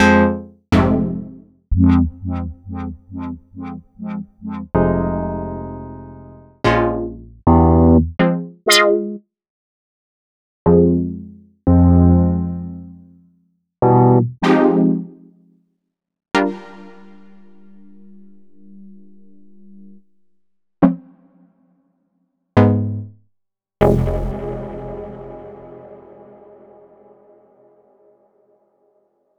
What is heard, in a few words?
stabs